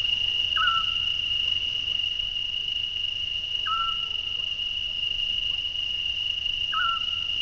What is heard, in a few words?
call, otus scops